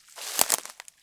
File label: Crunchy Step, outdoor, Footsteps